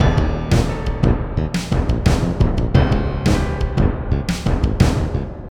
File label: You Failed; Die Hard 4.0 The Mobile Game